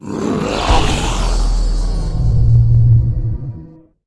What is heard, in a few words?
stone dead